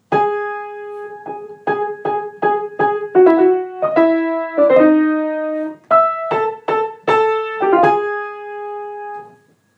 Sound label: Orchestral interlude; Pavel Haas; from arlatan; summer of 1944